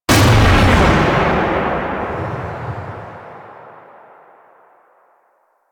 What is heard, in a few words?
Gravitational Instability Explode; Warframe; Weapons; Archwing; Powers